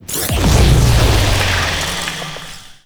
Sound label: Corruption Fracture Close; Warframe; Zariman; Missions